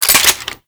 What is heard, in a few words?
Reload, Weapons; Hek